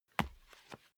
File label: step barefoot wood parquet single left; menschen; schritte